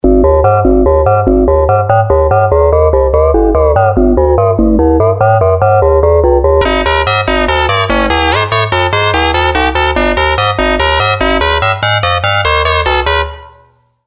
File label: Plan-B Model 25